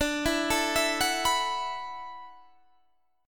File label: E 9th Suspended 4th D, guitar